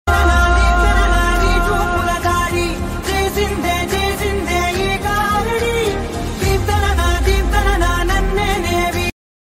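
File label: ringtone love background music; allu sirish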